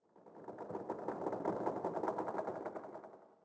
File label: sting tree creak; Rust